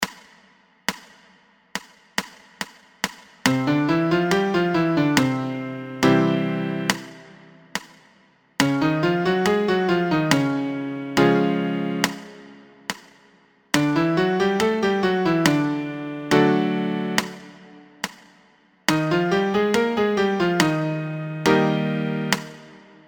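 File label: 5 Finger Triad chromatic 140; Piano Practice Tracks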